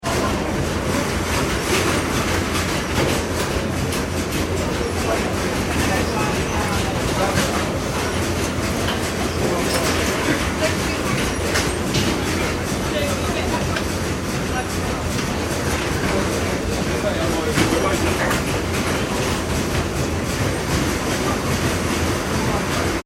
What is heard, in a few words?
ambience airport la rochelle baggage reclaim; Airport Ambience - Baggage Reclaim ( Small Room ) - La Rochelle Airport; Airports; Backgrounds; McKinney Sound